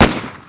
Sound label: Colt 45 (Frontier), guns; Beep Sounds